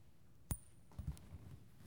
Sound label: Coin Toss